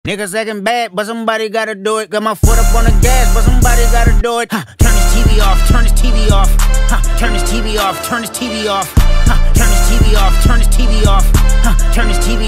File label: Tv Off Ringtone